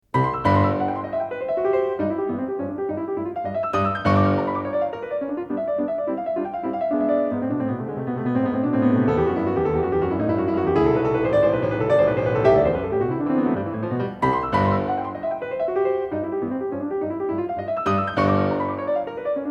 33 Piano Variations in C; Anton Diabelli

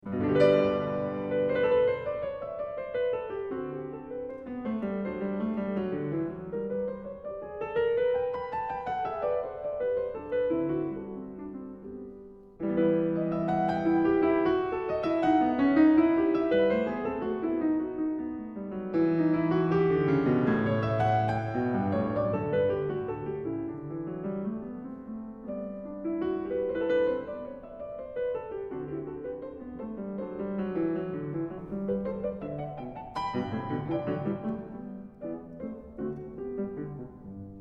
Cello and Piano

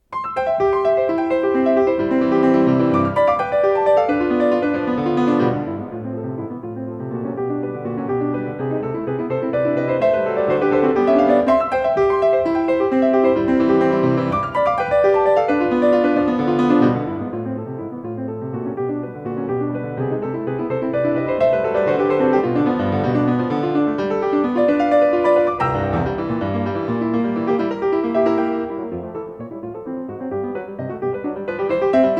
33 Piano Variations in C; Anton Diabelli

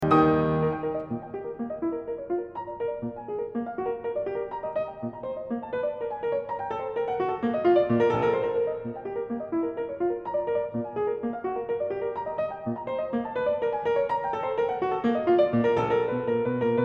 15 Piano Variations and Fugue in E flat

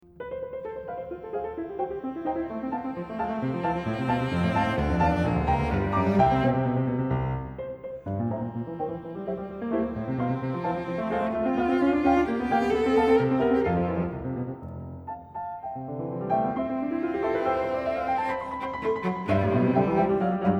12 Variations for Cello and Piano

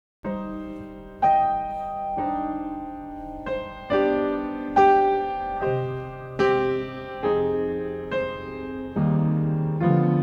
Piano; Piano Demo

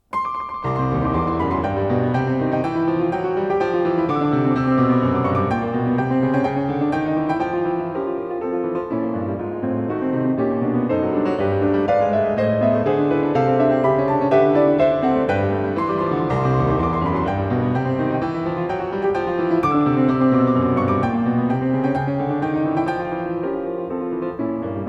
33 Piano Variations in C; Anton Diabelli

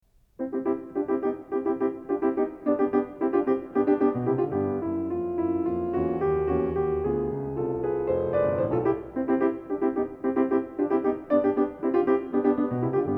33 Piano Variations in C; Anton Diabelli

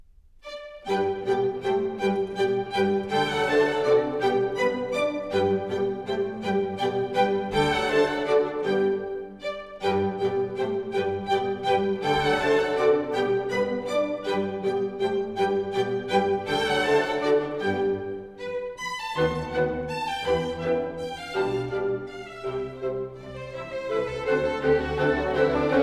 German Dance in G Major

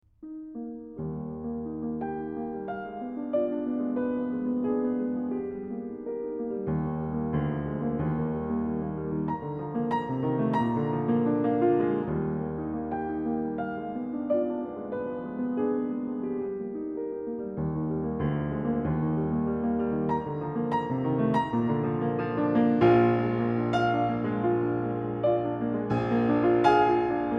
15 Piano Variations and Fugue in E flat

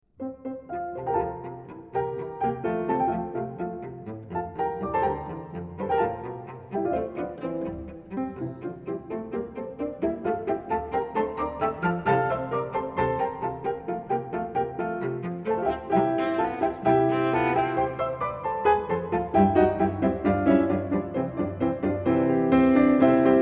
12 Variations for Cello and Piano

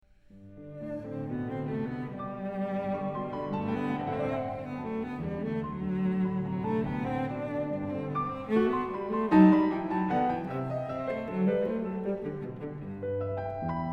12 Variations for Cello and Piano

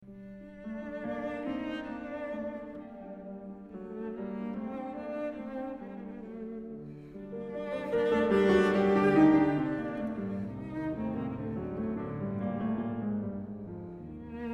12 Variations for Cello and Piano

